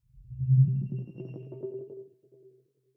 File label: sci-fi display transmission modulated